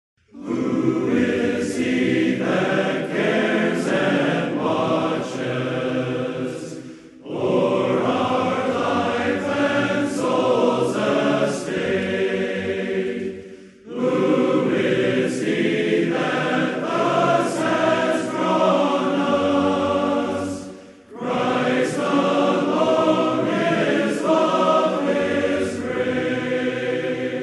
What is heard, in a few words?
Harp Medley